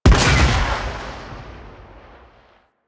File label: Weapon Punch Rail Gun; Warframe; Weapons; Heavy Weapons